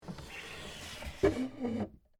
Drawer Open Desk, Foley, Drawer, Open, Antique, Wood, Desk, Small; Drawers